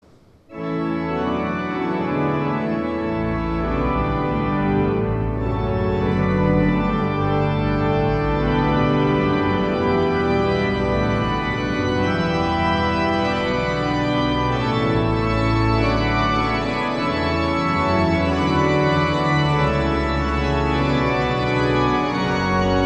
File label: Organ; St. John's Cathedral, Jacksonville Florida